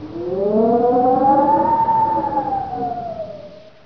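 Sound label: desert wind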